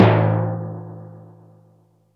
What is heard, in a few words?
TIMPANI